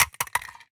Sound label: phys mag drop smg metal concrete, magazine drops